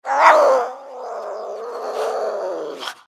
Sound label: giant rat yawn; npc